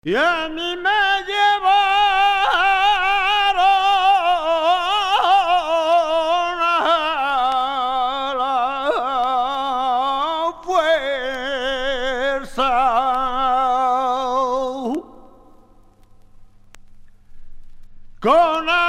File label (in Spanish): Tona, flamenco